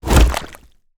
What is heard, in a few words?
mountain dweller texture mud whoosh impact; Bluezone Corporation